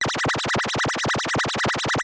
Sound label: error